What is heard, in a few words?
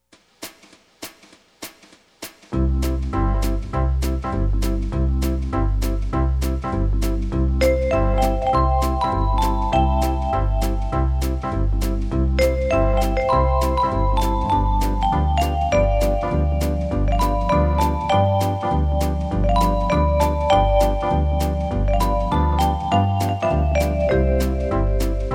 VibraJazz sample